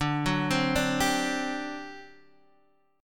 D 11th D, down, Guitar, Half Step Down

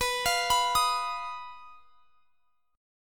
B Major Flat 5th; arpeggiated; x,x,21,22,24,23

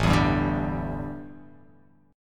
A 7th Suspended 4th, A, down

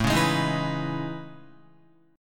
A Major Sharp 11th, Guitar; AM#11; strummed; JGuitar

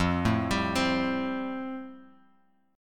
F Major Add 9th, Guitar Open G

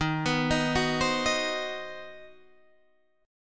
C Minor Major 7th Flat 5th, D sharp, guitar chord

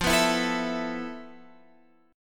F Major 7th Flat 5th, Guitar, Open F; strummed; FM7b5; JGuitar

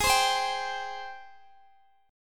G sharp Major 7th G sharp, Guitar Open D

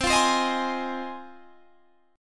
Ab 6th C

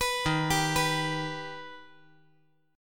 D sharp Augmented D sharp, chord; D#+ arpeggiated; JGuitar

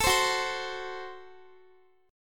Gb Diminished Guitar

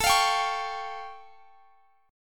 A Augmented 7th A Guitar Standard; x,x,19,22,20,21; strummed; capo=9

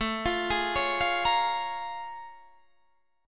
Bb Minor 7th A; Bbm7/A arpeggiated

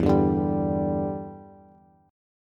E Minor 7th, down, Bass; strummed

